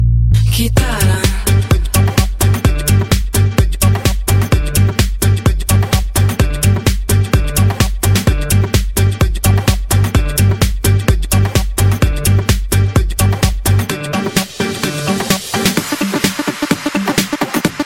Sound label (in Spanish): Guitarra